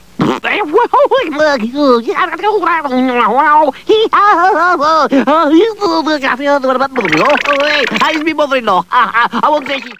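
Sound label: giggle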